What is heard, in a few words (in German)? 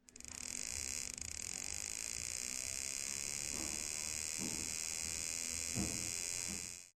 Durchgangsprüfer